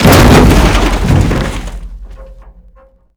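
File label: vehicle death wreck crush; WAR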